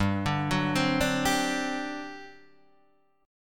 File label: G Major 7th Suspended 4th, G, down, Guitar, Half Step Down; GM7sus4